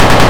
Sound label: Machine Gun Loop